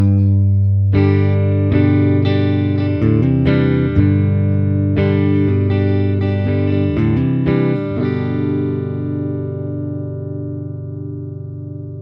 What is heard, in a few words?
crate fw15r cln